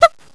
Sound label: HONK, Transportation